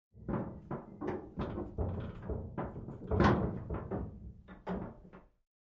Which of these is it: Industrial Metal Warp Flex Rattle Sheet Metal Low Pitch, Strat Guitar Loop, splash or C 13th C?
Industrial Metal Warp Flex Rattle Sheet Metal Low Pitch